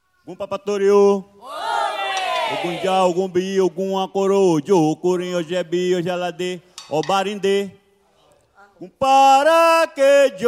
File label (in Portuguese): toada para ogum